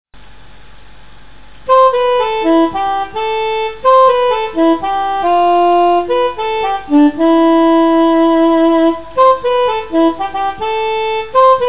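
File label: Sax Piano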